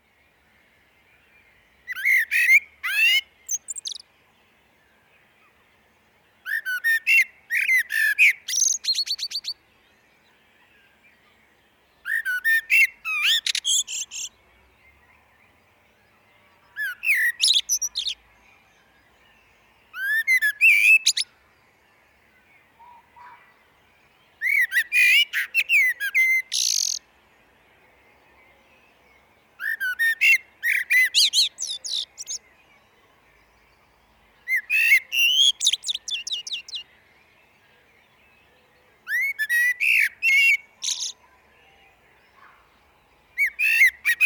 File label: Blackbird glen